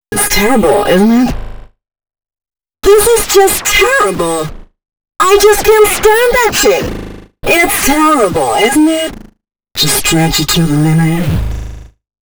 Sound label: female c#, Dance Ultra Voice FX; Mutekki Media